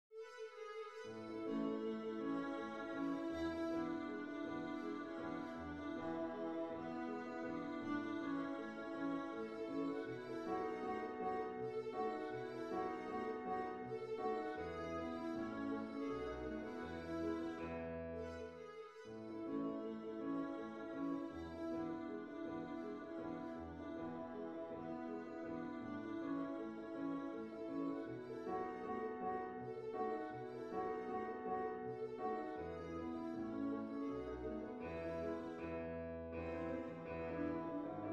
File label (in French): menuet quintette cordes; boccherini luigi